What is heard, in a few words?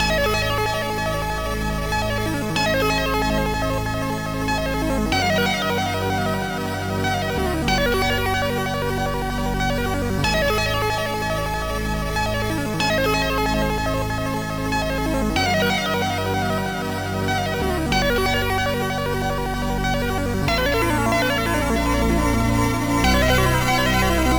underwater.mod; coma favorites modland